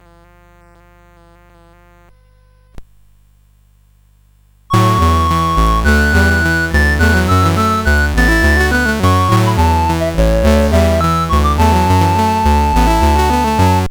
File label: Fudge Chords, CSG8580R5